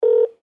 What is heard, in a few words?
modem